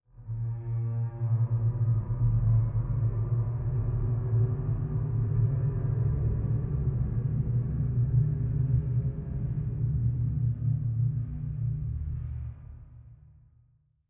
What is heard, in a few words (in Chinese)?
楼道怪声; 增加过道怪声